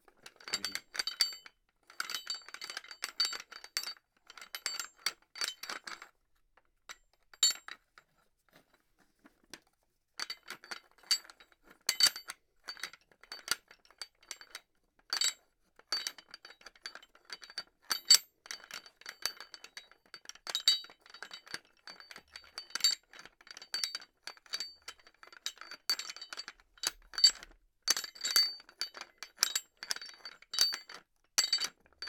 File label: BOAT Swing 2 Pulleys Fast; Secret Source; 001 Boat Pulley